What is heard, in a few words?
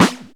Flange Snares